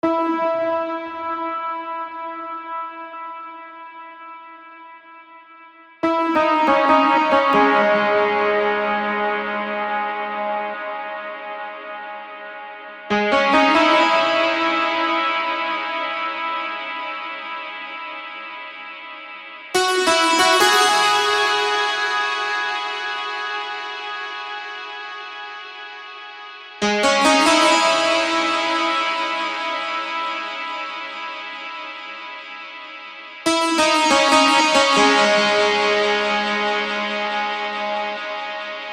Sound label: wet guitar